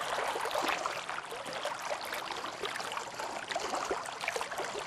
amb dock water; angel city; Titanfall 2

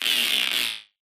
bullet energy titan shield spang cluster

